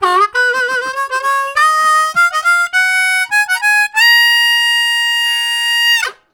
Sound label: Working Up, Harmonica Riffs; Zero G